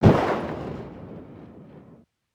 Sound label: smg attack distant; Rust